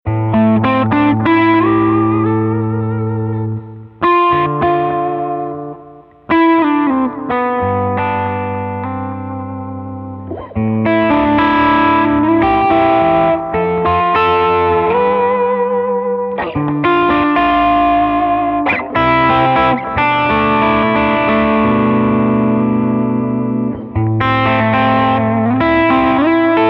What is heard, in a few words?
Way Huge Aquapuss Ibanez S470 Maxon TB09; Victoria 2012